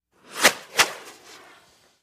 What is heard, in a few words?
Fireworks Spinners